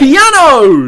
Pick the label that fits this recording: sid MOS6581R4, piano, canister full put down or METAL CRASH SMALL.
piano